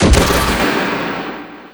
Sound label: attack stop, combine gunship; npc